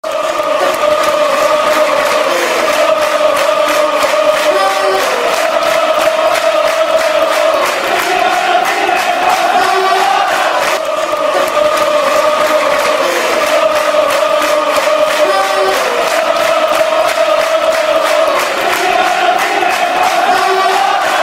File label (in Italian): Vinci Per Noi, cori curva nord